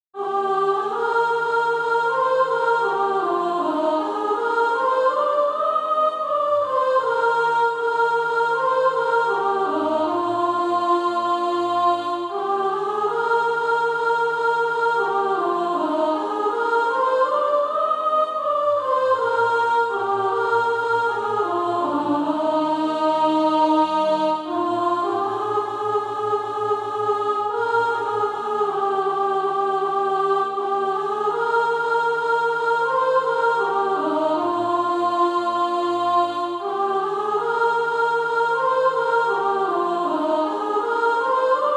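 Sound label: soprano, Practise Music; Darling Nelly Gray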